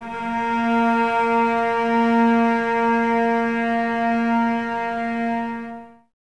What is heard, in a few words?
Cellos A#3, String Ensembles, No Vib; Miroslav Vitous